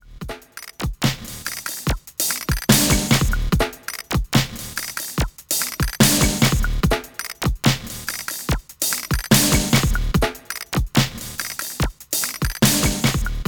kaossilator Loop Schraeg

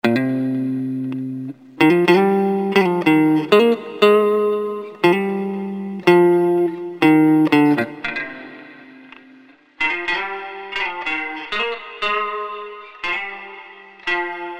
Gitarre

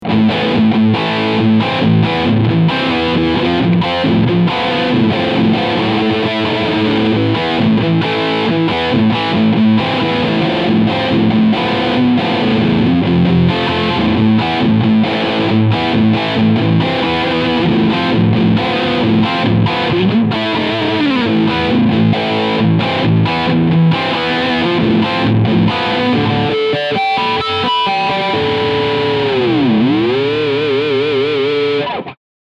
Harley Benton SC Custom II FR Vintage Black Riff Steg PU

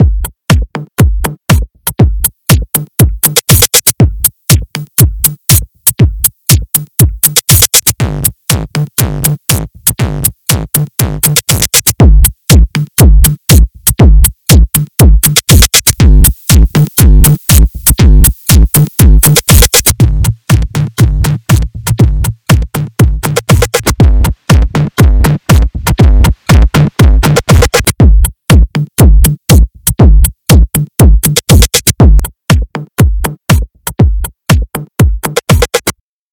Drumloop mit Amp

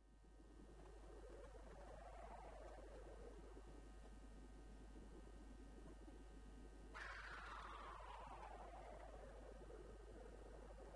Dreckiges Rauschen

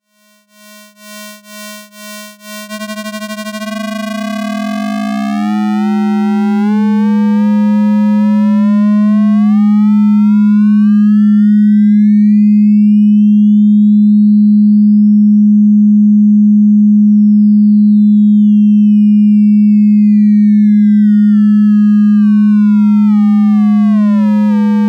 E-RM Polygogo Cycle On Parameterfahrt Order

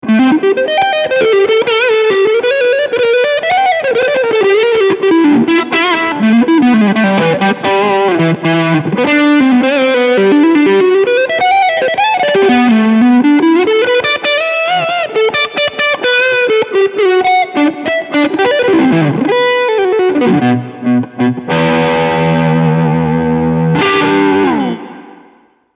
Leadsound Low Gain MIT Hall

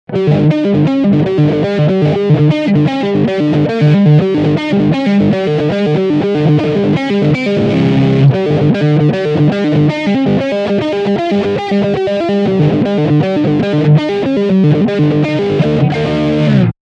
Riff beide Humbucker

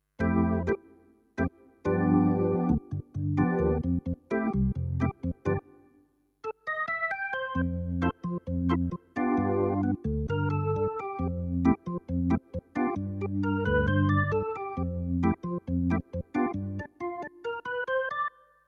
Yamaha P105 Orgel

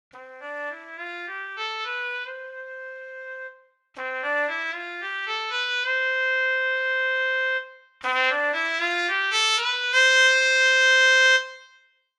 Trompete Straight

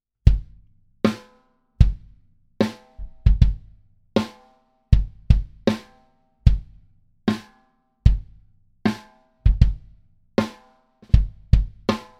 Snare und Kick beide

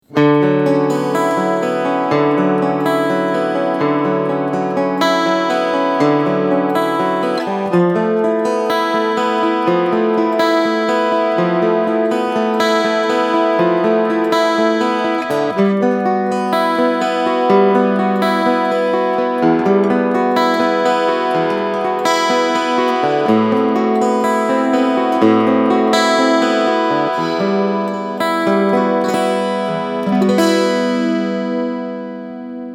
ME 3 Chorus Effekt; LAVA MUSIC

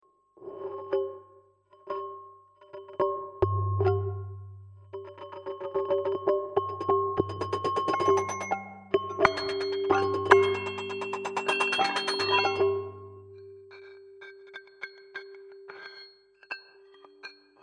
clings Kratzen

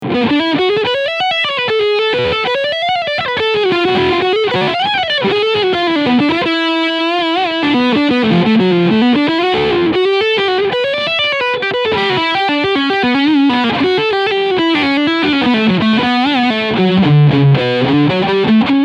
Solar Guitars SB1.6 Lead Sound Steg Humbucker

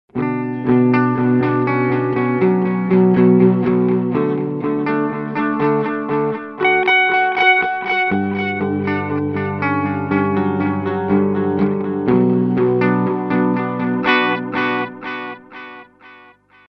Belle Epoch mit Modulation; Catalinbread

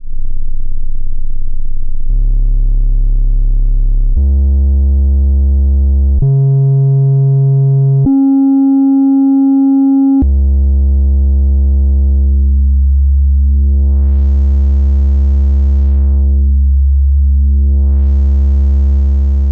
Behringer 110 VCO Dreieck Range und Filter

